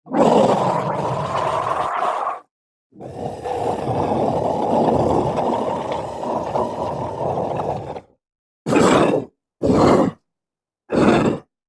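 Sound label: voices and screams of the dead, monster scream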